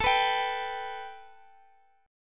A 7th Suspended 2nd, A, down, Guitar, Open D

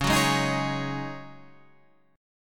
C sharp Suspended 2nd Suspended 4th, C sharp

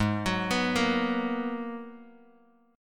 Ab Minor 9th down Bass Standard; arpeggiated; JGuitar

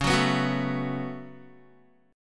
Db 7th Suspended 4th Sharp 5th Db down Guitar

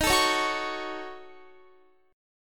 D sharp Minor 7th Flat 5th D sharp, down, Guitar; D#m7b5; strummed; 4E,4A,5D,5G,5C,6F; chord shape

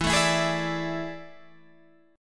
A Major 7th Suspended 4th E

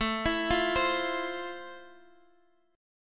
F Major 7th, A

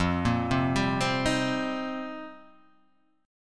F 7th Suspended 2nd Suspended 4th F

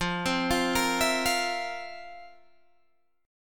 F Major 7th Suspended 4th, Guitar, Open G

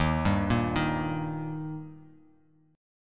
Eb Major Eb down; arpeggiated; JGuitar

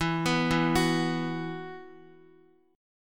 E Suspended 2nd, Esus2 chord, Guitar; arpeggiated; x,x,4,4,4,2

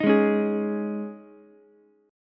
C sharp Suspended 2nd, C sharp, Ukulele; C#sus2; strummed; JGuitar

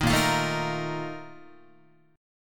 Bb Major 7th Suspended 4th, Guitar; strummed

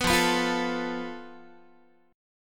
F Major Flat 5th Guitar, chord; FMb5; 4A,4E,5A,5D,5G#,6C#; strummed

